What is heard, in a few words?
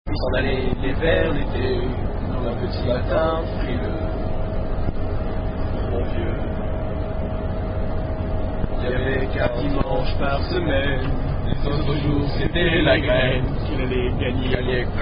Rugby, French Rugby Song